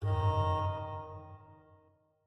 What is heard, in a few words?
instrument note